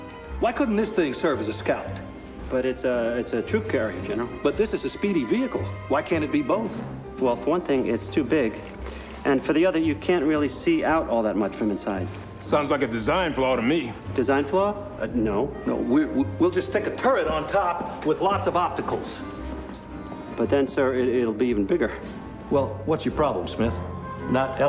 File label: Egg Chant; Pent Scout